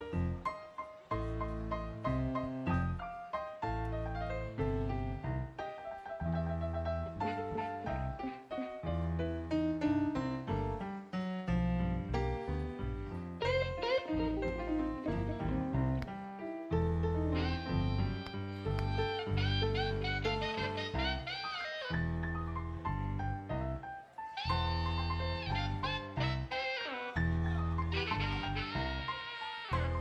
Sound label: piano intro